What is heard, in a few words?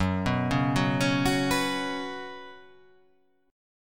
F sharp Suspended 4th